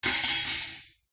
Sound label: impact metal object small light drop into small metal basket